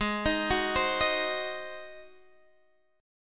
Ab 7th Ab down; arpeggiated

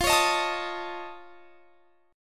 F Augmented 7th F, chord; F+7 strummed

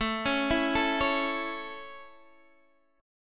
A Major, Guitar, Half Step Down

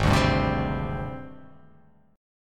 G sharp Major 7th Flat 5th

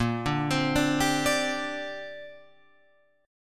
Bb 6th Add 9th Bb Guitar Open F; Bb6add9 arpeggiated; 10,9,12,9,10,9

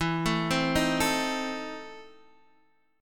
E Major 7th Suspended 4th Sharp 5th; EM7sus4#5 Chord {X 7 7 5 4 5} arpeggiated

